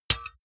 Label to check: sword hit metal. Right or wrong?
Right